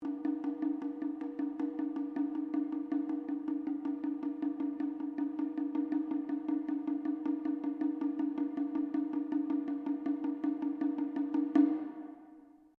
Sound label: DRUM TAIKO SHIMEB P SEQUENCE, Small Japanese Taiko drum; Miscellaneous Musical